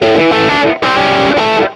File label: complete media burn; jam; stereo